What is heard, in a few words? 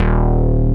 SELECTED BASS; Masterbits; Dance Trax